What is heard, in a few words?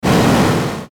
Static Fast Gust Burst